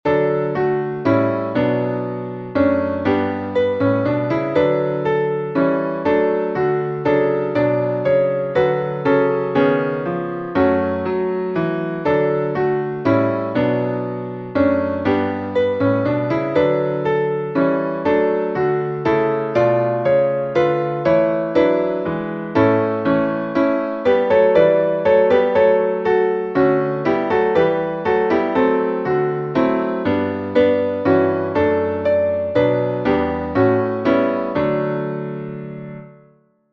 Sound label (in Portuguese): salmo playback